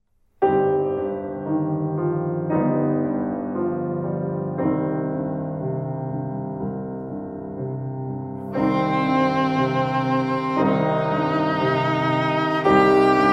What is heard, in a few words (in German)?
Tonalization c-Moll